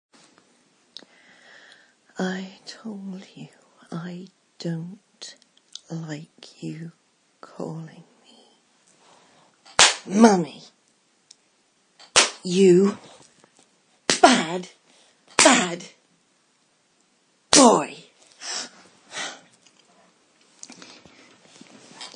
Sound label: spanks; F4A; you needed this